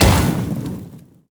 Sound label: sfx towers cannoneers incendiary Ammo impact